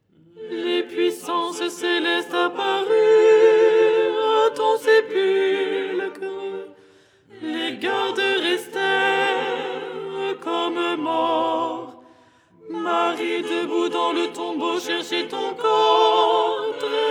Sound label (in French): alto; Tropaire du dimanche; Les 8 tons en français; ton 6